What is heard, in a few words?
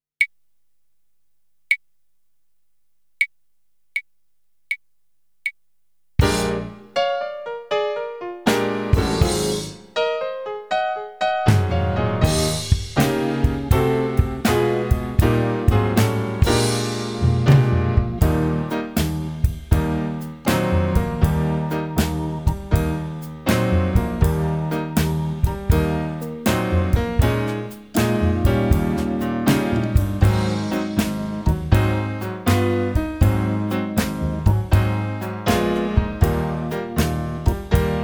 Blues